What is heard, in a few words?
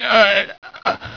DYING; BattleSNDS